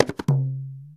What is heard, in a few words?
INDIAN DR B6, INDIAN PERC; Spectrasonics - Heart of Asia CD2